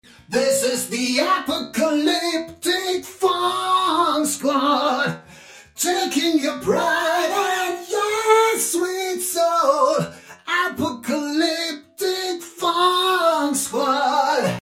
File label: Vocoloco Flanger; Radial Engineering